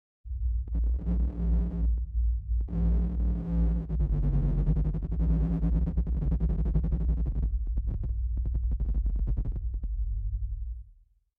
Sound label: ambient; black market